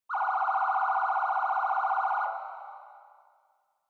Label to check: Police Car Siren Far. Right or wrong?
Right